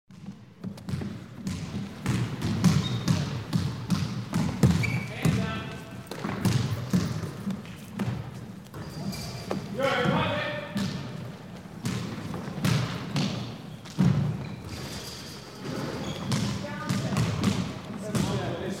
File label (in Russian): obchiy fon igry c vizgom krossovok